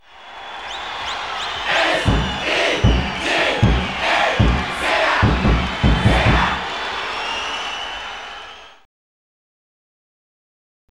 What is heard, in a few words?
Sega Chant; saturn sampler audio cd 1996